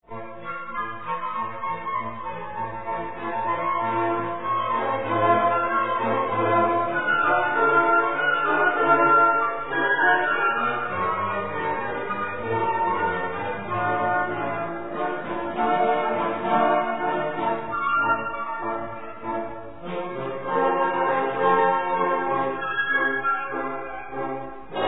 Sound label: doppler rondo